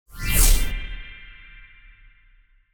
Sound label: ui claim